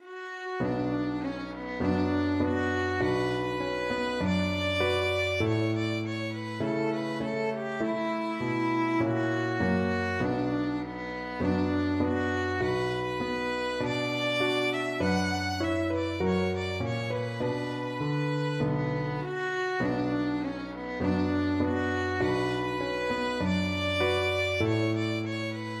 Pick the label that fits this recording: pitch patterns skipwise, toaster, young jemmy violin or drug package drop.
young jemmy violin